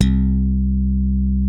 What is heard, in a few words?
DYNA MID C3, DIGITAL BASS; Music Store - Claudius Diemeter Schwerlos